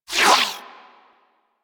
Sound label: Beam Retract; Glaxion Beam Moa